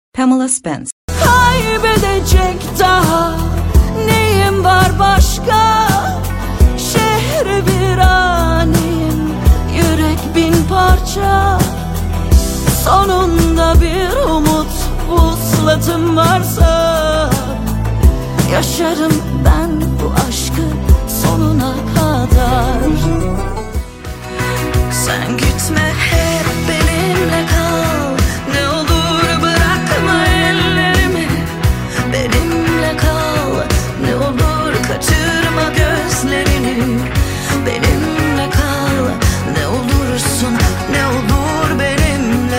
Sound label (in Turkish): turkce vokal